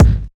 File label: thumpy kick sound g sharp key, Royality free steel kick drum sample tuned to the G# note